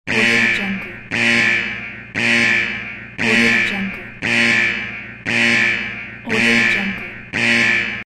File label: red alarm; soundforces